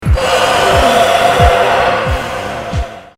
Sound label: beast screech; shadow